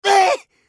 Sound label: scav wound; eft